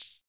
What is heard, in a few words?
HiHat Open Rhythm77